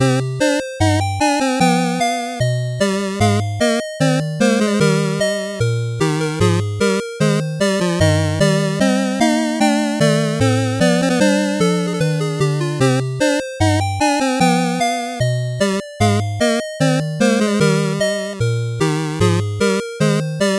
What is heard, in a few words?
8bit bgm slow